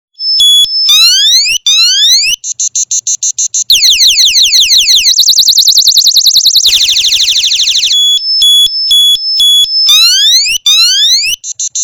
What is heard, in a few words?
alarm; アラーム